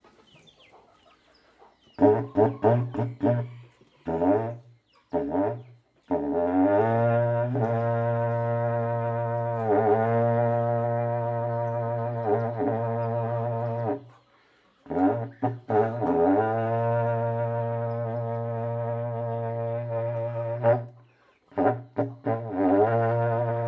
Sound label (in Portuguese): Berrante em ambiente interno com galinhas; gravacao em xy